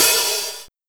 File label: HiHat Mid, Linn-9000; Drums